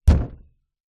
household door front wooden knock single fist hard